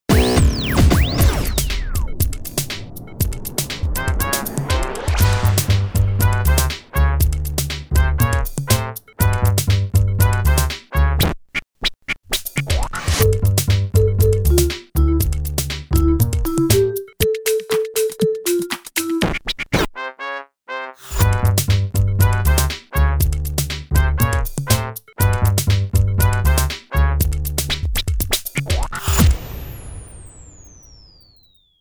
bed wierd lounge

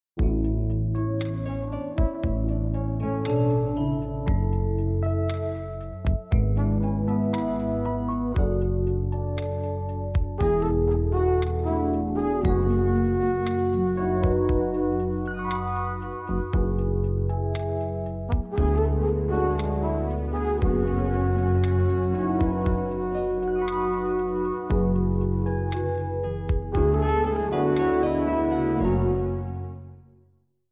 Easy Listening & Classical; Sound Ideas

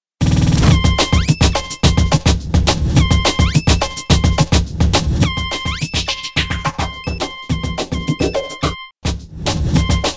bed bent toner

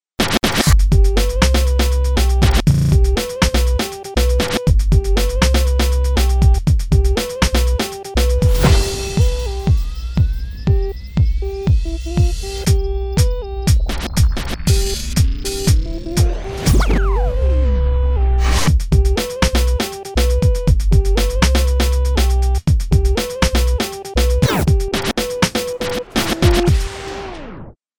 synth lead

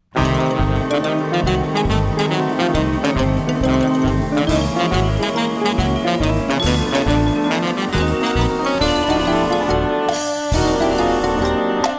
Comedy Style; Sound Ideas - Comedy Styles

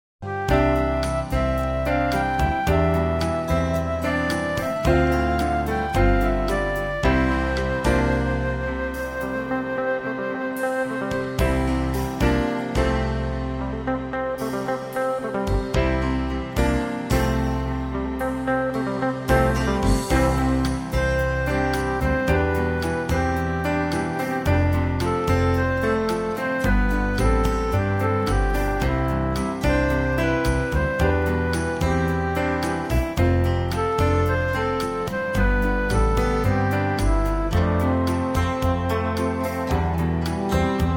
Easy Listening & Classical; Sound Ideas

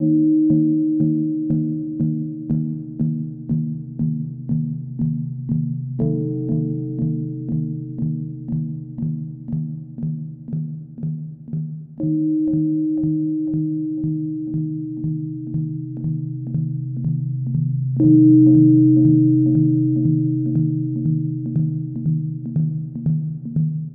Waiting, time passing

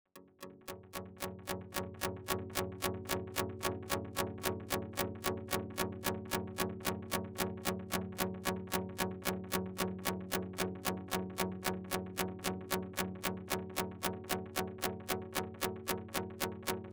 Rythem Chords, getting ready to act, dramatic

